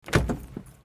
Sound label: Push Bar Fire Door Opening